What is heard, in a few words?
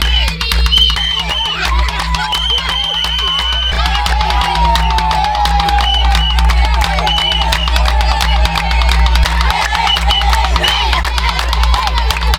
africa dance nigeria; best service Blue Box